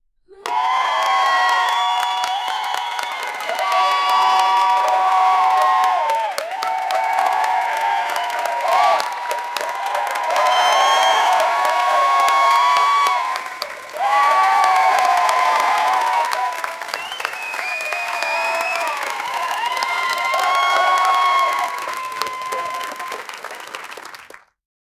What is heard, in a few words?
cheering and ap, applause; uso style